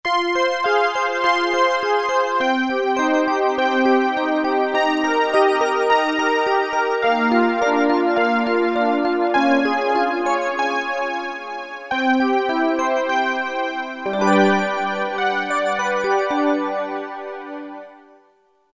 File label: synth rain; Factory Presets